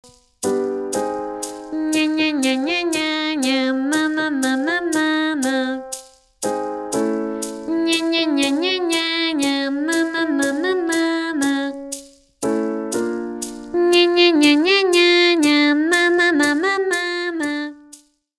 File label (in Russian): Распевка НаНа